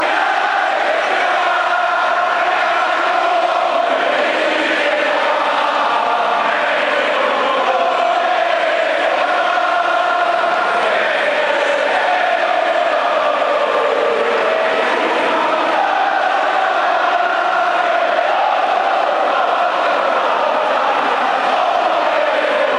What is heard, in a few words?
a capella anthem, chants